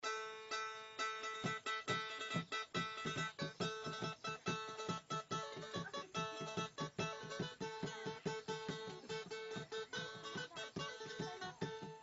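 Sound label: phillipines background music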